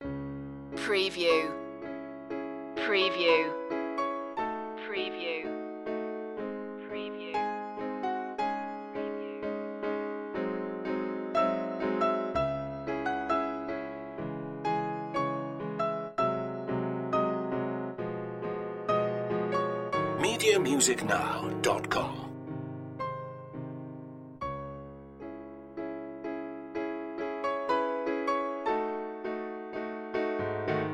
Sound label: courtroom thriller classical